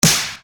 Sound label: Snare Dr